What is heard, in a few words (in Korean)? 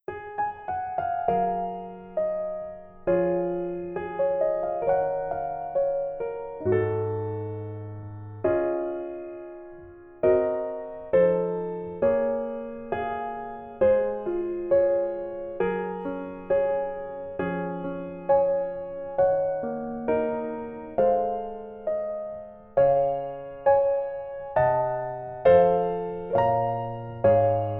Piano ver, -1키